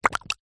cartoon slime movement fast

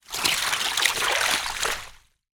nature water icy broken shards movement

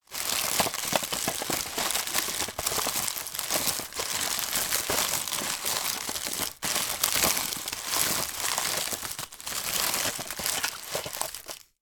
rummage in tin of chocolates in wrappers long; food; sound effects; sound effects one

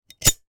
stapler loading mechanism release; office

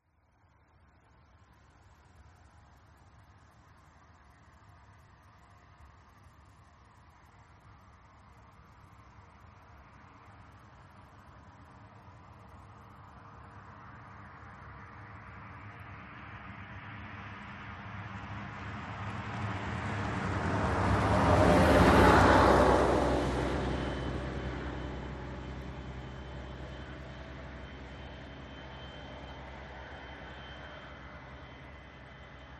Traffic Country; Audio Hero

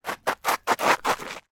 footsteps person run up and stop gravel ground; foley

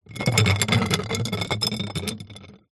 glass jar containing water roll concrete

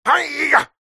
human fight karate vocalisation male

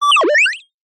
science fiction robot small cute chirp

